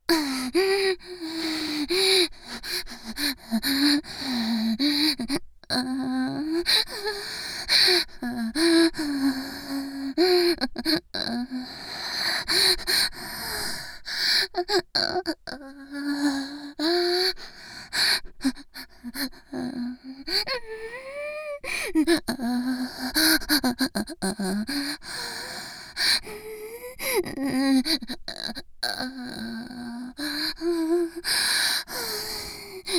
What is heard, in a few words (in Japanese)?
チン嗅ぎと裏筋舐め上げ 右 喘ぎ